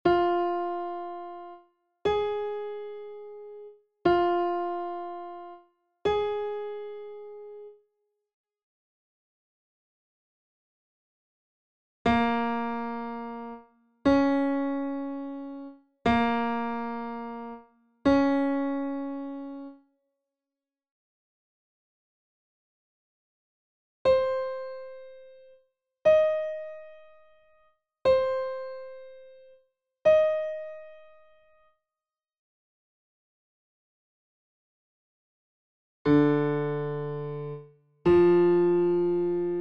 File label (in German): intervalle kl terz nachoben